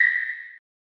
sonar

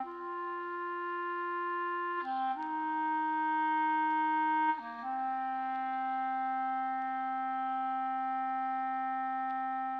MELODICS, viral hiphop

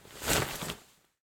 cloth Remove; spellbound

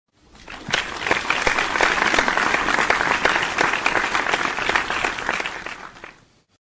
applause, stadium

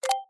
Dice Button Click

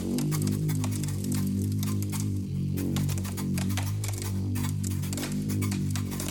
Shield Weakened

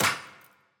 Shrapnel Hit

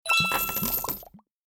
buy item

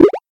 shoots

